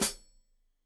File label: Hat Foot, Hi-Hat, Cymbals Stereo